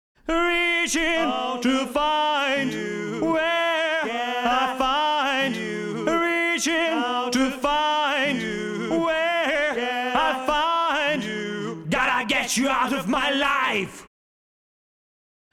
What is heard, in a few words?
VOCALS BEFORE AFTER; Editing VOCALS; 15 sec BEFORE + 15 sec AFTER